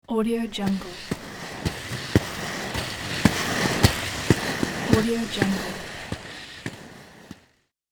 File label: rollerblade skate